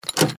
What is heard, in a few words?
flame turret close